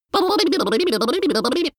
lip smacking child long; vocals; comedy-cartoon; soundpool

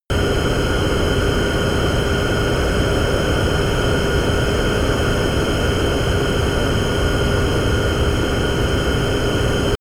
plane bombardier private turbine idle close; flugzeuge verkehrsflugzeuge; verkehr transport

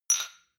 shot glass clink bounce medium; soundpool

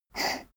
poker chip slide table very short; soundpool

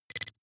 noise flick splutter; maschinen; signale; soundpool

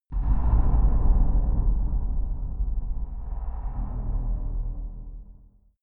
far dark metal rumble, hits impacts

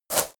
herb pickup; industrie wirtschaft; bauernhof

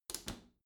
bathroom cabinet close soft; objekte; tueren

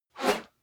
whoosh whistle

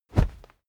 whoosh cloth soft dirty; swooshes-swishes

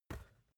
basketball catch light; sport-freizeit

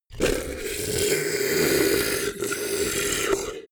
beast powerless grunt long weak; kreaturen; comedy-cartoon; soundpool

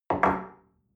espresso place on table soft, restaurant cafe

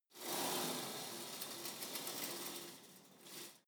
pullup machine cable pull soft; sport freizeit; fitnessstudio

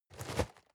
cardboard box medium shake single; objekte

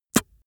spit; soundpool

